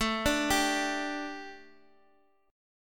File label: A 7th Suspended 4th A, Guitar DADGAD; chord